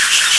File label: Augh!; SNES; Champions World Class Soccer